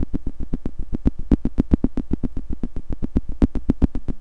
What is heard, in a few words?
Subtractive Beat